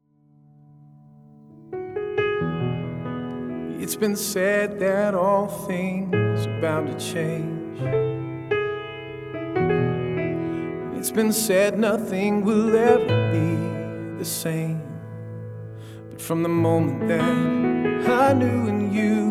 Piano Spiritual

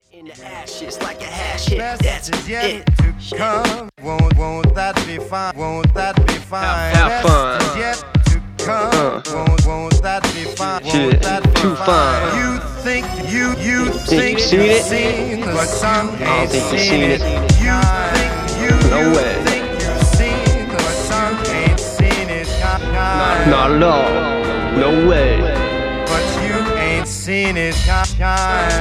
Hiphop

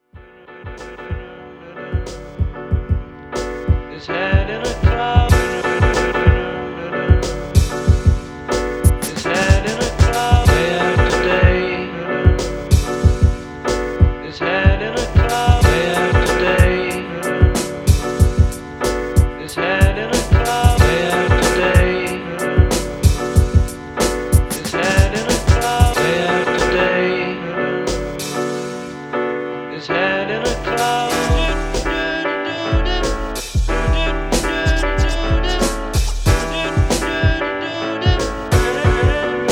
Remix Beat

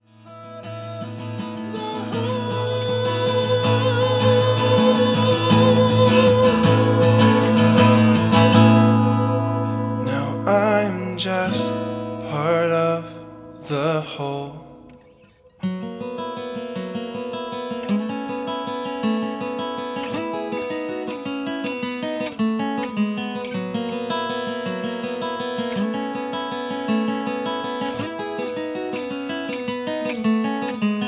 Acoustic Ambient

